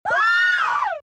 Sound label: Floaty Baby Roar; The Binding of Isaac